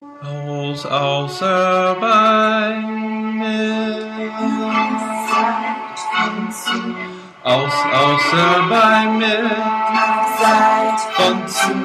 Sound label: blue danube sung